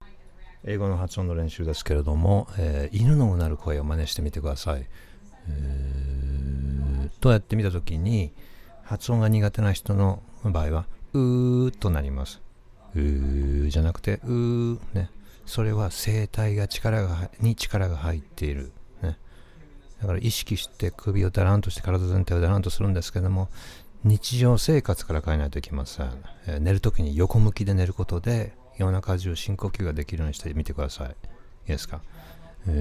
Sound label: growl practice